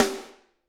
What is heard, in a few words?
SD, Drums; Bob Clearmountain Drums I